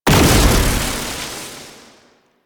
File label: Alchemy Grenade Explosion Electricity; Warframe; Entrati